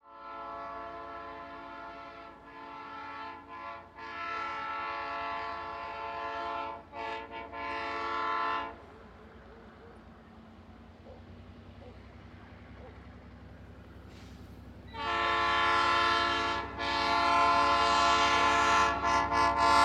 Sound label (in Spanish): TREN PASAJE TAMBO VALLE DEL MANTARO